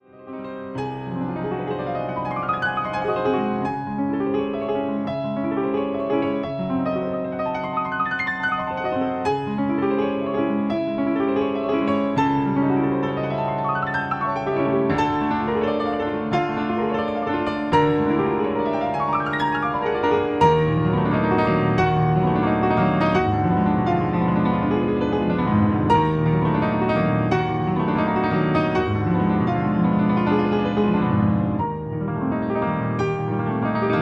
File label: Grand piano; P 194 Storm; PETROF